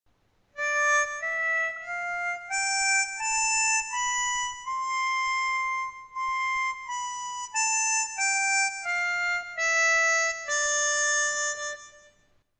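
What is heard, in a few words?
4 hole scale C Major, harmonica; christmas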